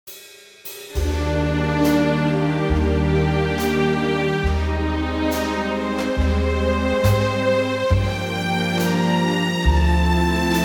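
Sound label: Eb orchestra; key - Eb - vocal range - Bb to C; gorgeous orchestral arrangement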